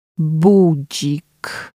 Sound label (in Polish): budzik